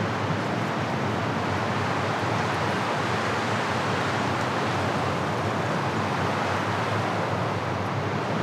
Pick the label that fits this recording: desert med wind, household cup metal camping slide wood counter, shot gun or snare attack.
desert med wind